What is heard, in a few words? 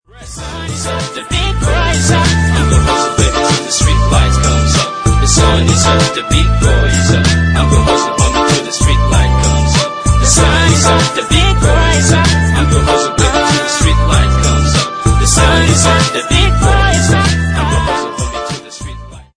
iphone ringtone